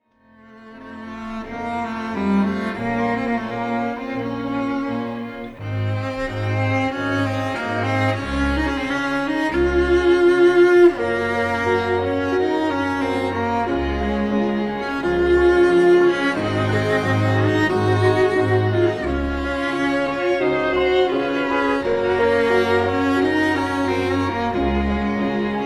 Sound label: Quintet in A; Schubert